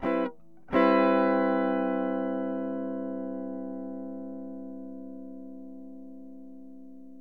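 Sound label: major 7th, synth chime; cubase; CUBASIS; GUITAR; ELECTRIC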